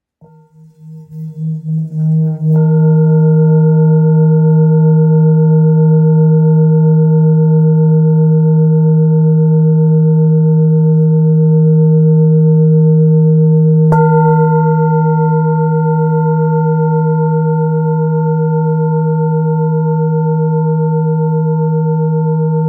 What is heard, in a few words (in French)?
Bol full moon re diese 600g